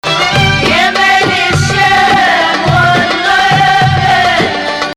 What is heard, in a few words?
Rast Ya Maal 2nd Rast